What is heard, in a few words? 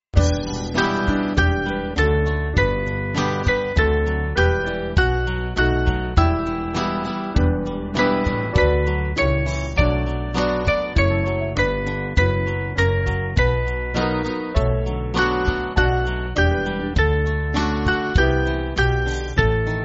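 Band, Backing Files for Churches; Come Risen Lord; Song 24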